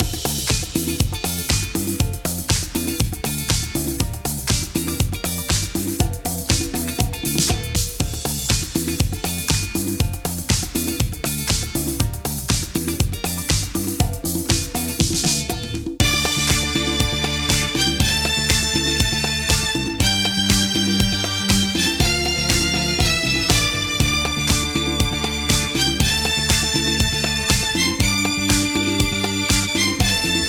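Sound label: extra ambient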